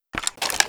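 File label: weapon foley spas12 reload lift; Call of Duty Modern Warfare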